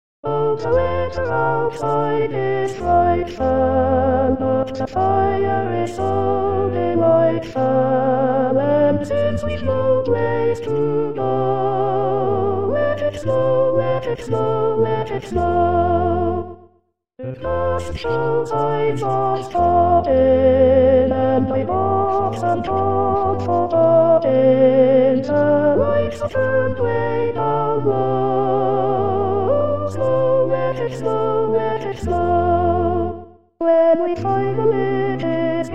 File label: Sops